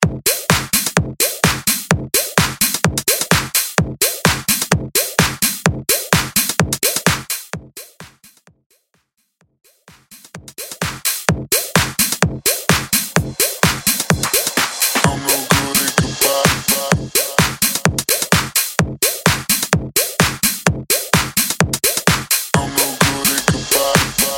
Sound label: Bass House Edit